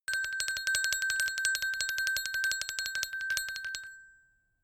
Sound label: glockenspiel tremolo high; percussion